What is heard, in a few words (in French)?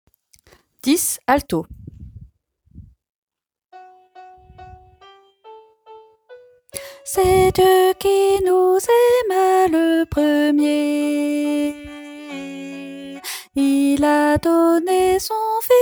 alto